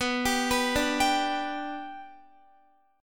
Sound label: B Minor Sharp 5th, Guitar; arpeggiated